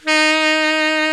TENOR SAX

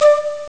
shakuhachi; ST-XX Amiga Soundtracker Sample Packs

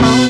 HIT, Hits & Brass

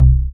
DM BAS, Dance Mega Synth

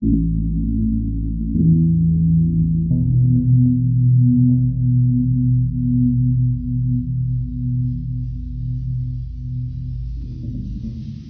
Deep Gtr Bass; Sonic Foundry (Sony Creative Software); Knutrix Drum & Bass

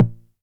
909 SD Tape

